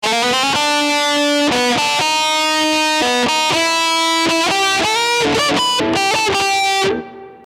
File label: guiter